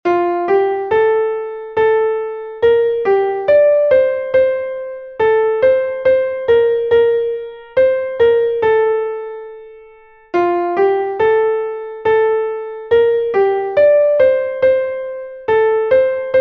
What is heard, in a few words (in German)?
klavier; weisst du wieviel sternlein stehen; melodiemeister